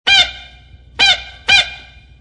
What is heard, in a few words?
Taxi Horn; ring tones